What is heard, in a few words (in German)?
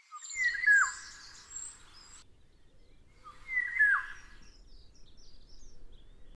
Pirol kurz